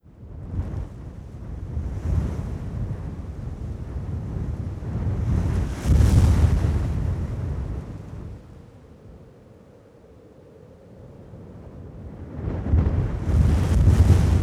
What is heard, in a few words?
Wind Blowing Sand Heavy